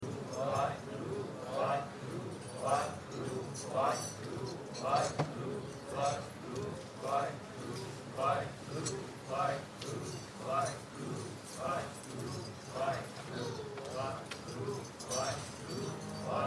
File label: Keertan; UK Smagam 2010